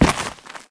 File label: gravel footstep